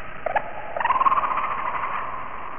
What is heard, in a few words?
bat